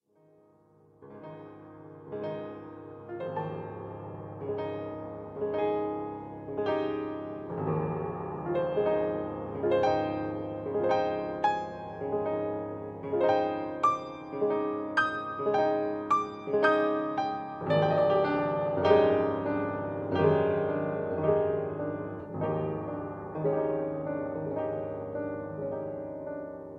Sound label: Improvisation Fragment